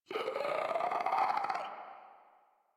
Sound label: Infested Runner Patrol; Warframe; Runners